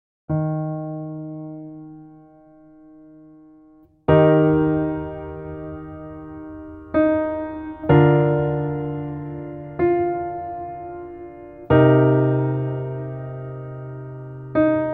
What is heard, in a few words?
Piano ver